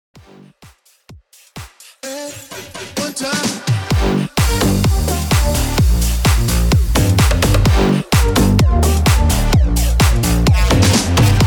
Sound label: 128 BPM